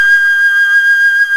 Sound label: FLUTE & BELL; OMI - Universe of Sounds